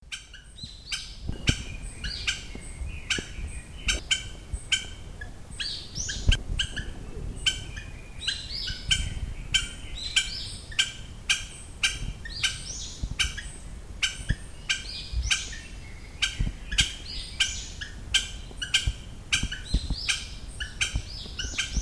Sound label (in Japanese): aogera